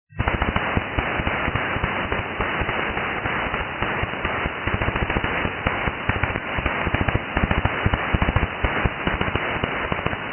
am msg

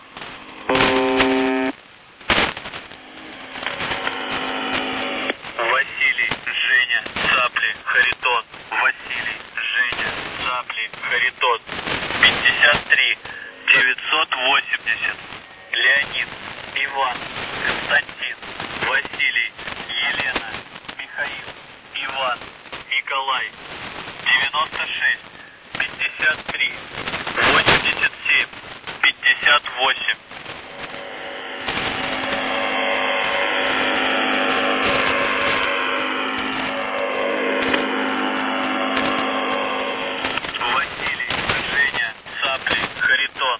buzzer usb msg

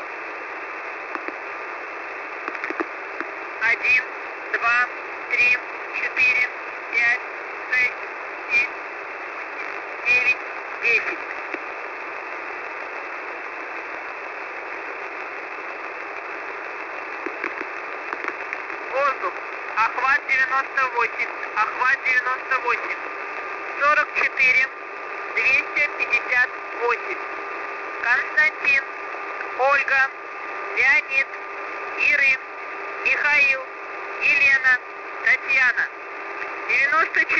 usb msg; goose 4310 usb

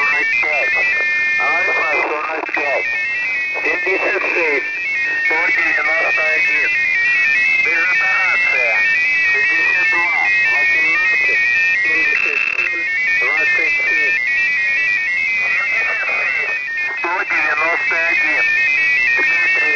usb msg with fsk

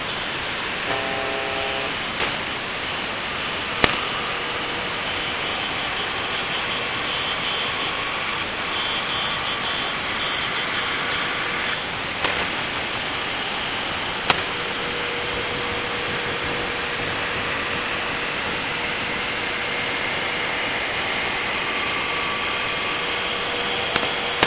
buzzer usb msg

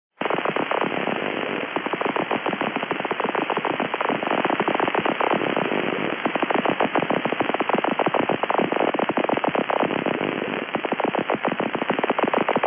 noise; xpa2

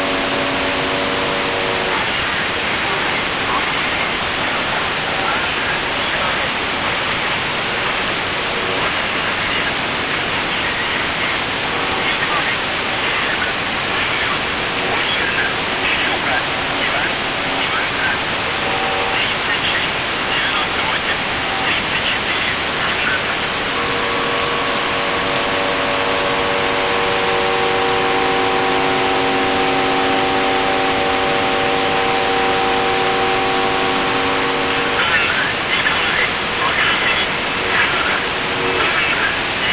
buzzer usb msg

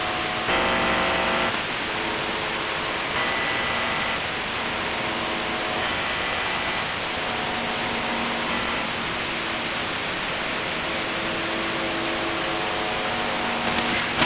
buzzer usb msg

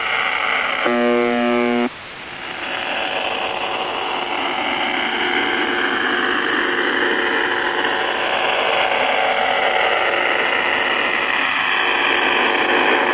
buzzer usb msg

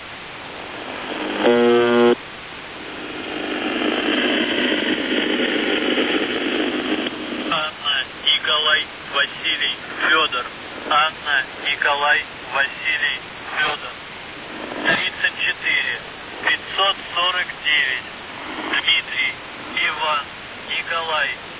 buzzer usb msg

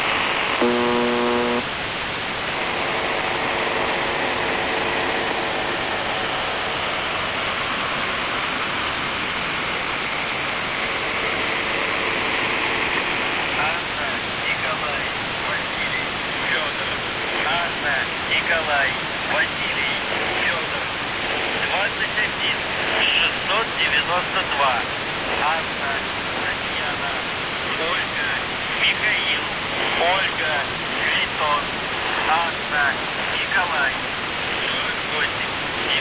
buzzer usb msg

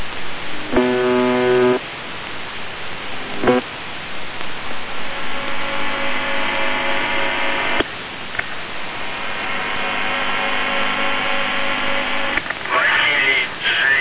buzzer usb msg

